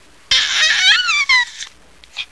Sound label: crybaby coo